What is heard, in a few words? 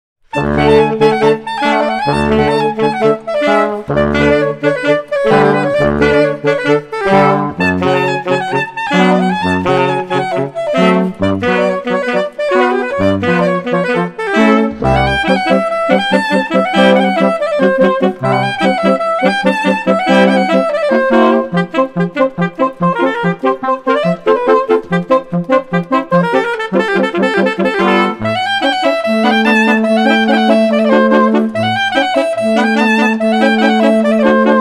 Romanian Folk Dances